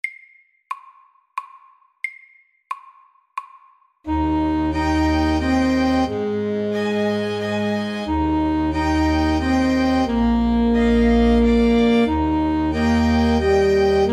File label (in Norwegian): Minivals FAST 90